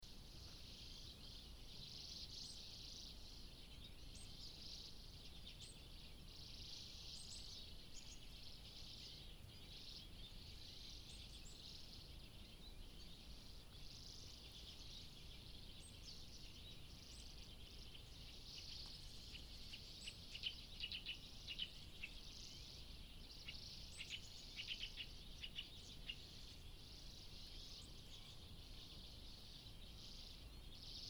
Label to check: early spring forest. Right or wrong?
Right